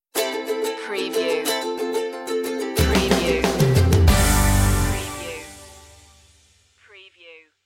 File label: open door sting